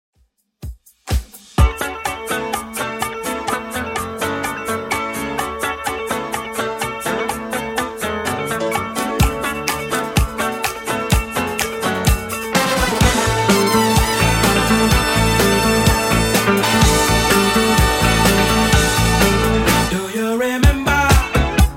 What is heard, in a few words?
80s Redrum 126bpm